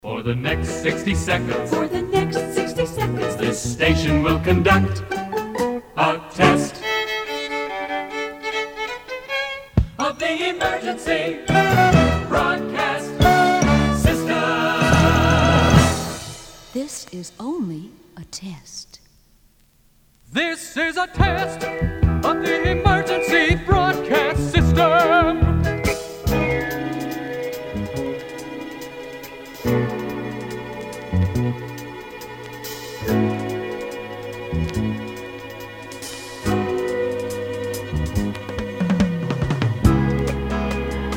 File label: Generic EBS Test Jingle 1970s